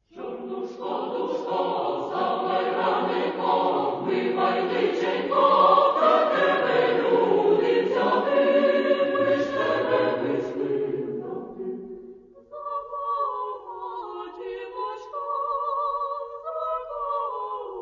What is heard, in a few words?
Choral Singing